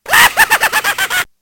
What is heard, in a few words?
clown laughter